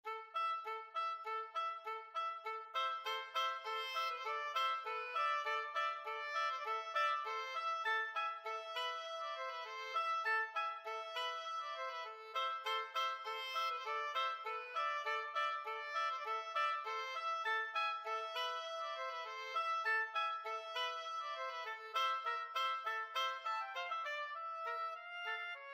oboe duet

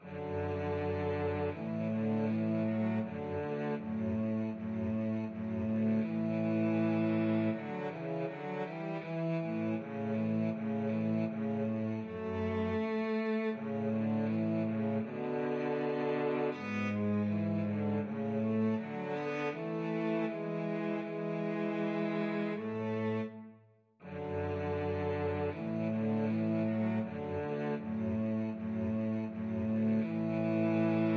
VLC DUET, cello duet

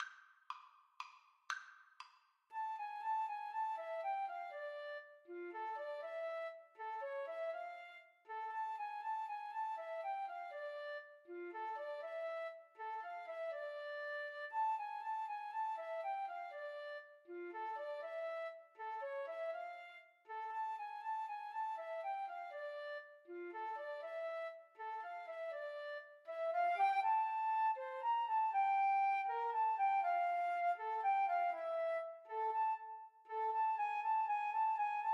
Flute Duet

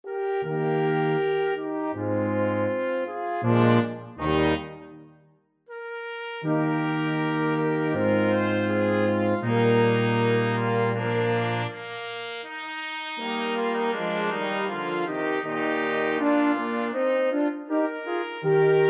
brass; flexible brass ensemble - 5 players

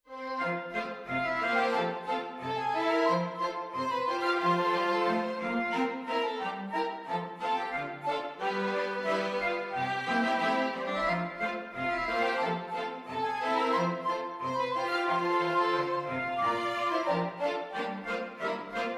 SAT sax, Flexible Mixed Ensemble 4 Players; Frank White Meacham - American Patrol (SAT sax) for Flexible Mixed Ensemble - 4 Players

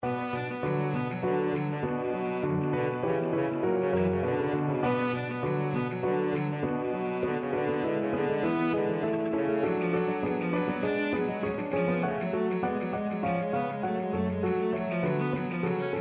bach badinerie, cello

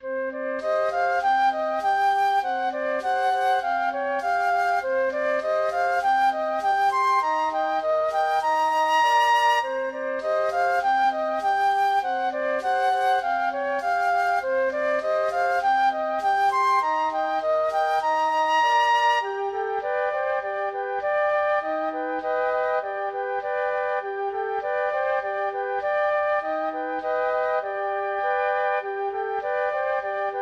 mountain king, flute trio

